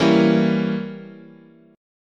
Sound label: E Diminished 7th, chord